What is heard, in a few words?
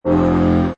Hi Tech Panel Servo Button